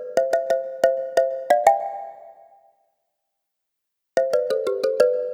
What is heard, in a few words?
Marimba; Сэмплы Mallet (Легкая музыка)